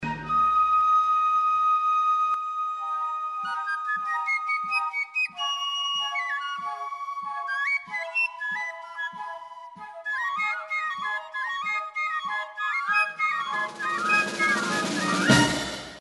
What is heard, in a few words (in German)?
floete